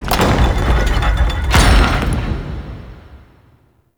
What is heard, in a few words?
Infested Hub Door Open; Ambience; Props; Warframe; Infested Eris